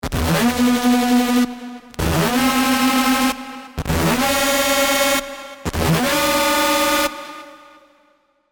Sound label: SY Screech Combs; big room techno; revealed serum big room techno vol 5